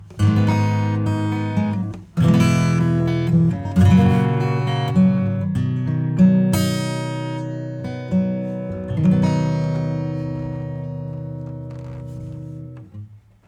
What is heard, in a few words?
acoustic 808 Low Crunch; Kelvin